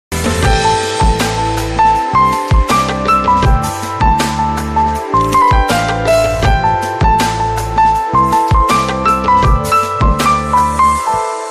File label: instrumental music ringtone, Pakistani Urdu; Haroon Kadwani; Kinza Hashmi